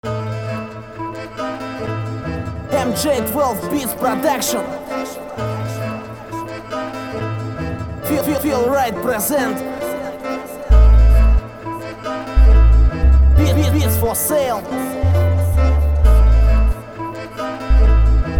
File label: Beat